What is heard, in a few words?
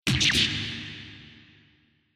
Cut